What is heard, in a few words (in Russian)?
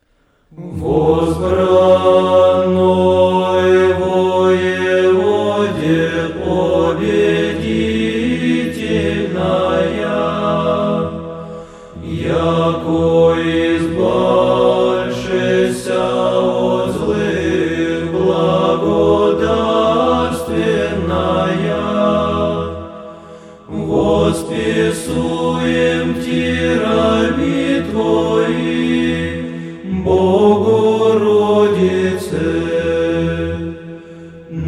Vzbrannoj Voevode gl. 8 (Vizantijskij raspev); Severnyj Afon